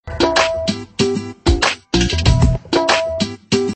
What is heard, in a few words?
Tabala Tune